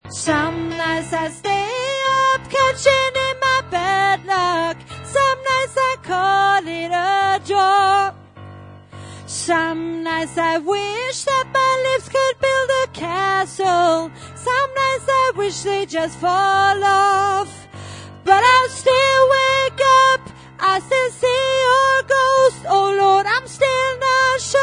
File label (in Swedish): sopran